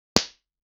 slap sound; impact sounds

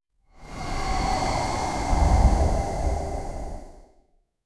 cliff cry

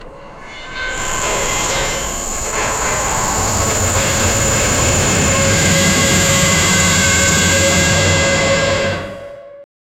mechanical

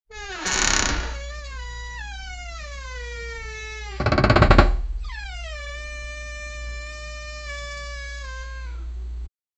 the door was jammed and; attempt

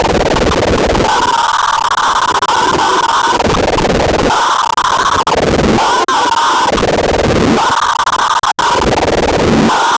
an 8 bit mechanism for mo

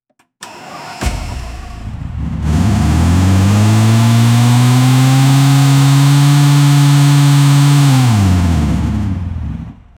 kawasaki zx10r, cold start

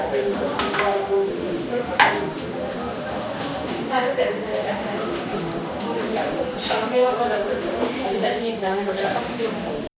caramel luscious coffee s, coffee shop

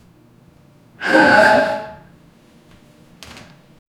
human sigh chairfabric s, chair scrape

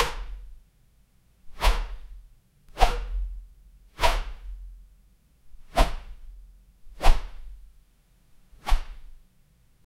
mermaid woman cant get up; mop

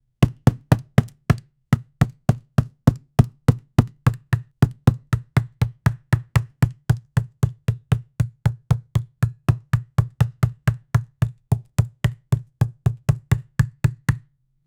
punching kicking, martial arts

